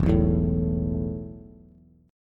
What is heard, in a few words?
E Suspended 4th E, down, Bass; Esus4 strummed